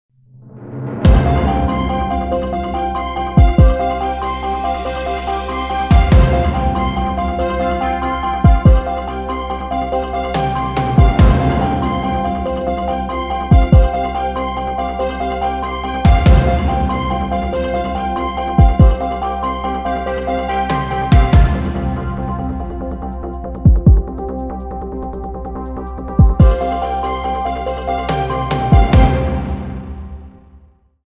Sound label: AMBIENT CLASSIC BED